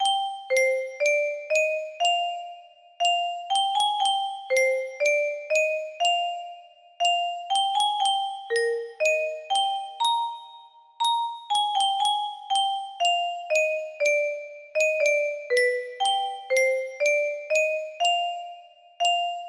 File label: 60bpm, music box melody